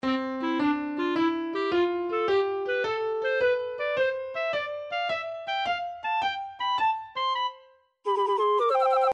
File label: get a prize; gameboy; sequenced; super mario land; firemario